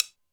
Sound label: Hi Sticks, Alesis D4 drum machine